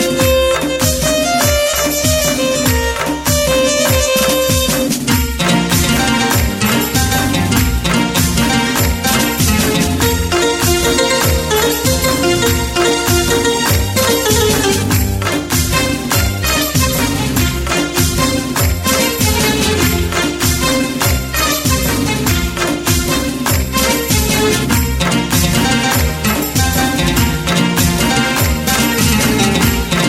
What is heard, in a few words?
Keyboard